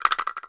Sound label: kpoko, Kenya Kpoko Kpoko